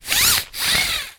dog leash lead small extendable retractable short pull then retract; animals